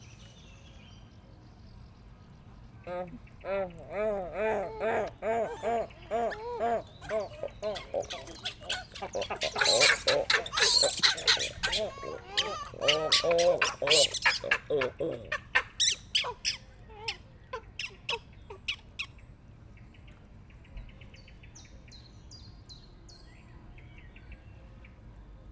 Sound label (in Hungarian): családi fennhang, dzseladapávián; allathangok; veszpremizoo 2013; akg